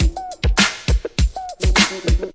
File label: guira rockbeat, 101 bpm, drum loop; 101 to 110 bpm